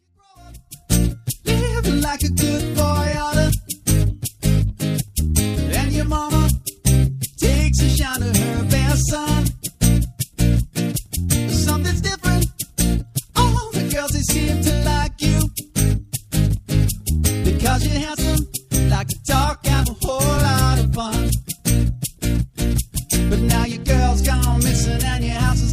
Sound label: Acoustic shoes